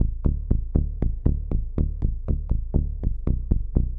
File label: SH101 Bass Loop (120BPM), retro house